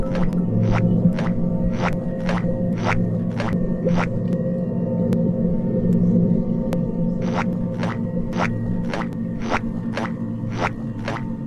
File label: walking; squidward